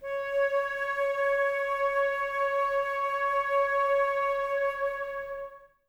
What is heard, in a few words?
Flute, Woodwind